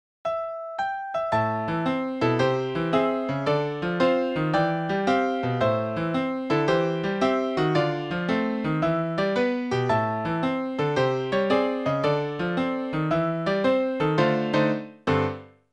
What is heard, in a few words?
Piano, MIDI; Aibo